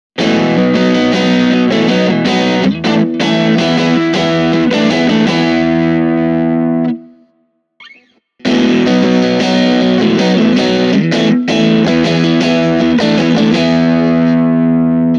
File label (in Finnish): Stratocaster – gain täysillä; pätkissä aloitetaan aina kaulamikrofonilla; Vox AC4 C1 Blue